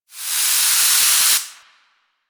Ocean Steam Blast; Warframe; Grineer Ocean

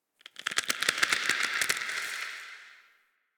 Tendon Stretch Medium Echo; Ambience; Misc Outdoor; Warframe; Infested Eris

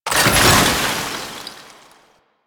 Alchemy Grenade Deposit Cold; Warframe; Entrati

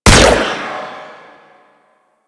Hyena Gun Fire, Enemies; Warframe

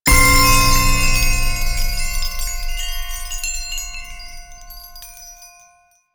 Chime Hit; Warframe; Duviri; Easter Eggs

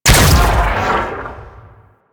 Rail Jack Rifle Secondary Shot; Warframe; Tenno

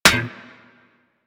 Melee Staff Impact Generic; Warframe; Weapons; Staffs

